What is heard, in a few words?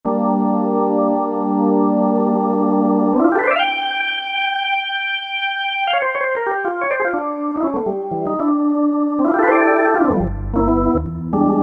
Slow to Fast Rotor, Roland PN-D50-01